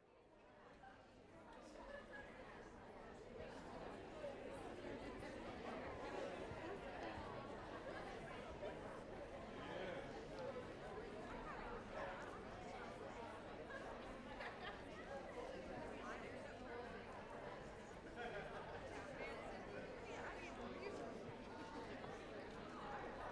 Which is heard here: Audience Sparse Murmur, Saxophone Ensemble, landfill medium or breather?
Audience Sparse Murmur